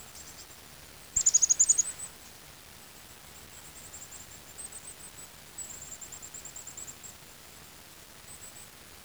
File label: chickadee, eastern washington